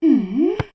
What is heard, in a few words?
Mmm; Midna